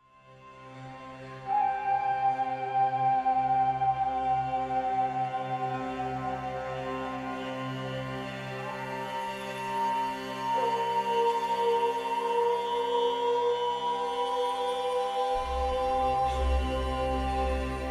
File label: Ambient Music; Athel Loren Exodite Eldar